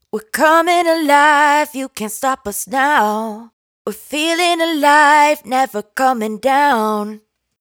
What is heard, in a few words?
Dry Vocals hook Coming Alive 128 Fm; Raw Vocals; Slate Digital Omnivox Vocal Sample Pack